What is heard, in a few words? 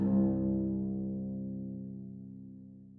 harp C2; Perc; M8; BBC